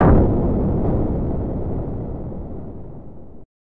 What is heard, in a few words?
explode; starfighter